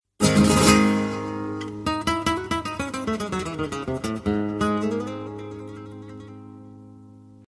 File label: flamingo guitar